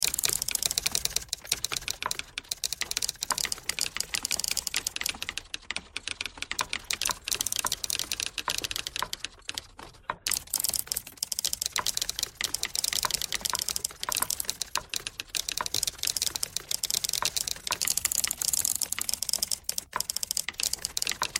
typewriter thread all

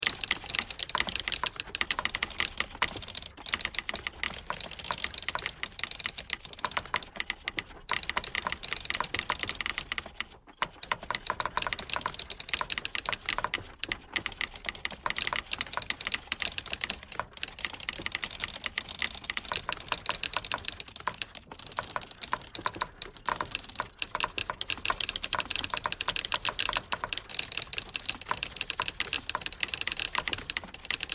typewriter thread pentatonic all

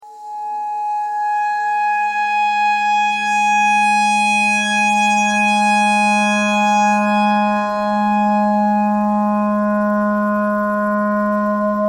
clarinet clarinet notes e simple

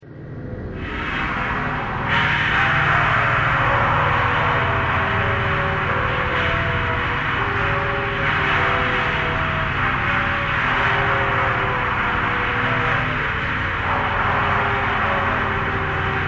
thread pentatonic

